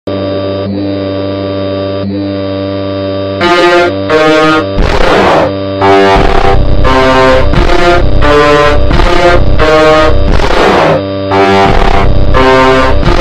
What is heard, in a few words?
funk ultra slowed loop